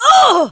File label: Pain, Barks; Kuva Lich; Persona B